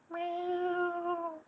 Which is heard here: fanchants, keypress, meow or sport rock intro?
meow